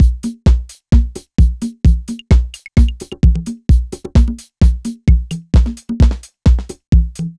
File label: Club Dance Beat